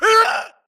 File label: grunt death quick; Titanfall 2